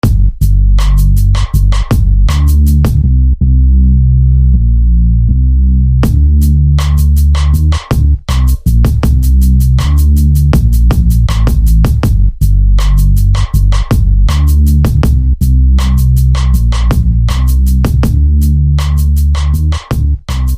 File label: Fierce Bass